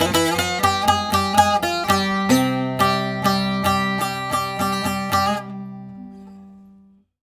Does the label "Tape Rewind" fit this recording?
No